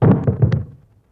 Dull Wood Hit Bounce; Vintage Cartoon; Drums & Percussion

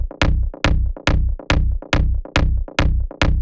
Bassline B 140 BPM; Loops; Bassline Hopkin

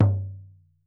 Frame Drum - G; percussion; Cymatics - Infinity Beta Pack 2.0; Zeus Percussion Collection

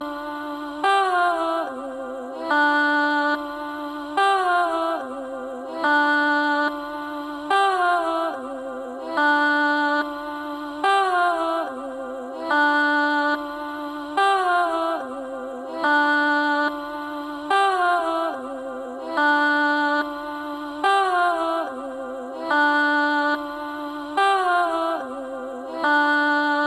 Vocal Chop - Drill - 144 BPM D Min; Cymatics; Infinity Vocal Collection

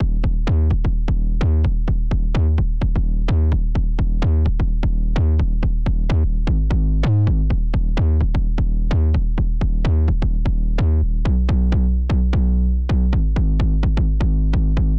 128 BPM D# Min Bass; House; Drop Loops; Loop Stems; Cymatics

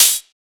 Open Hihat, Techno Drum Shots; Drum One-Shots; GhostHack; Ghosthack - Free Techno Drum Shots 2024

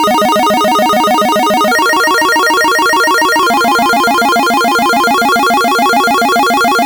Riddim Synth Loop D# (140); GameBro; Ghosthack; Riddim Essentials 2020

